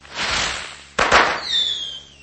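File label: firework single rocket launch high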